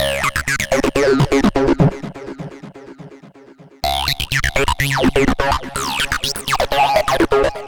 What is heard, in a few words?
synth; Cubase Magazine 46; Evolution 14